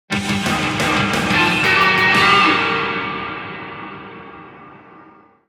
Music Stadium; ebaseball powerful pro yakyuu 2020 ps4 switch gamerip 2020

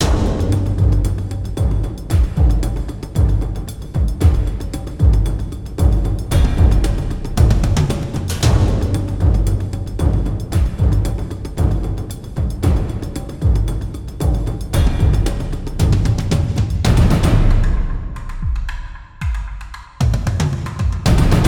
Combat Two Drums; Scraper Combat; W Phillips; Scraper First Strike

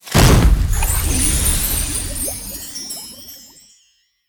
Magic Harvest; Levels Hub Swamp

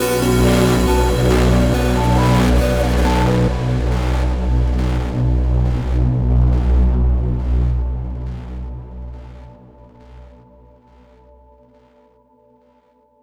Overlay Tone

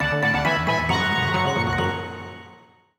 Level Up!; Fossil Fighters Champions; Nintendo DS

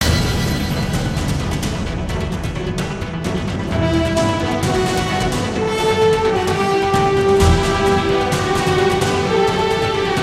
Cyber Cannon Battle

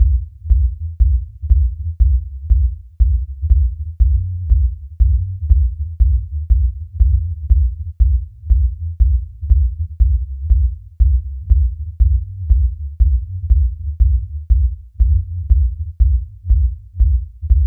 Lobby (Subwoofer); Inkopolis Square

